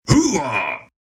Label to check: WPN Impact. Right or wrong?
Wrong